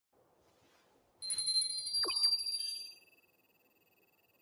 menu open; sao

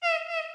sky honk baby manta

